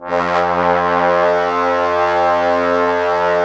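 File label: brass trombones F, Woodwind Brass Ensemble; 90 s Sample CDs; Miroslav Vitous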